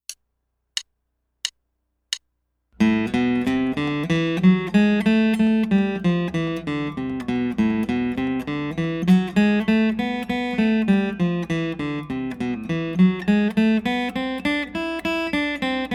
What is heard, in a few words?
Another Daily Scale Study In A Minor